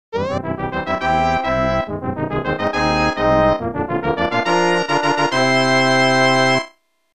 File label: level, music; marioroyale; allstarssmb